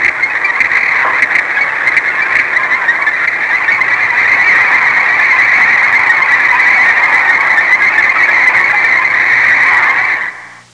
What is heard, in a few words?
Seagull flock